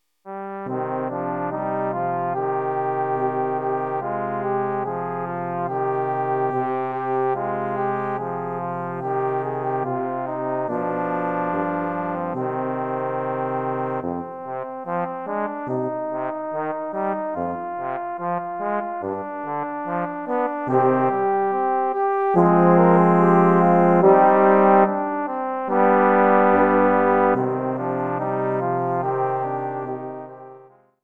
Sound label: Old French Air